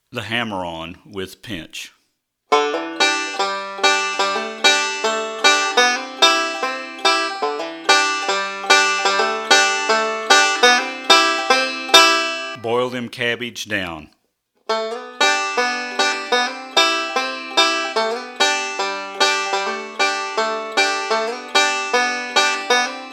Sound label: Hammer On